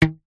elastic thin stretched ping; zapsplat; foley

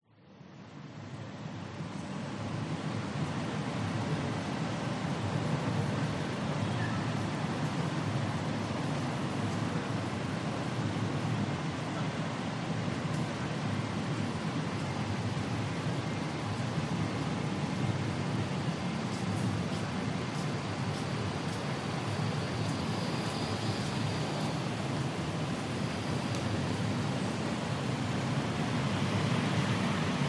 city singapore chinatown cars buses people

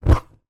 household gas appliance light ignite

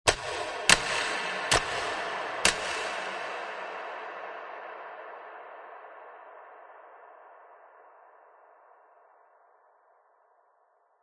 warehouse light switches huge reverb; zapsplat

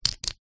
industrial metal crocodile clip press; zapsplat

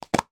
household shaving gel can lid off

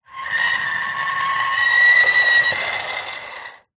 plastic small item scrape screech metal; foley

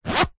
clothing bum bag belt zipper zip; zapsplat

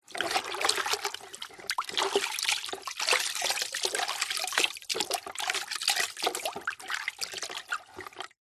household plastic bucket water shallow movements

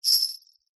musical sleigh bells shake single

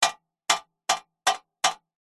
water drips x5 on metal; nature

